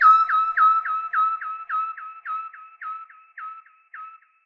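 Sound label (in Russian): соловей высокий монотонный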